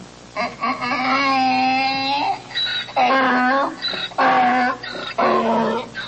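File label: sound of donkey that is tired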